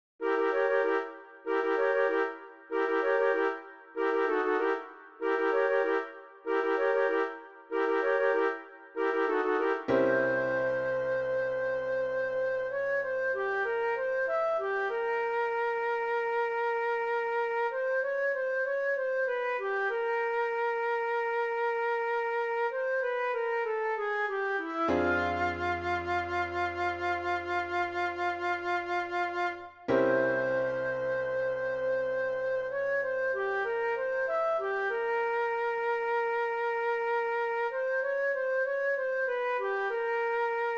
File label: Flute